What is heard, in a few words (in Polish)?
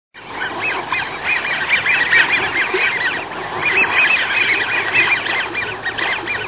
Świstuny Amerykańskie; wroblowe; anseri